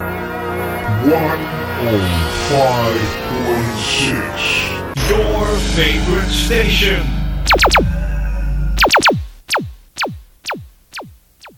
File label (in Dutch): Jingle; Station Calls